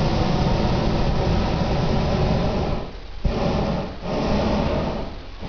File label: whoosh, balloon